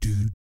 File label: "DOOT" G#2, Vocal Jazz; Spectrasonics; Vocal Planet Vol.3 Jazz Fx